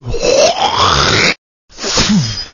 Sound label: spit